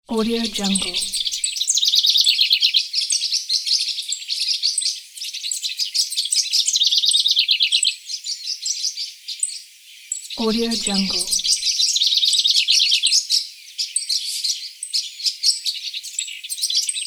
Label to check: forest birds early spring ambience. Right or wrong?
Right